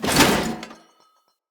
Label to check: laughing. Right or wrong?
Wrong